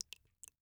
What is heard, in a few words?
Liquid Water Dripping In Water